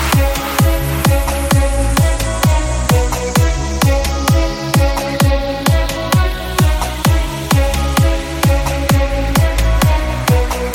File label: Mix, Ringtone; ringtones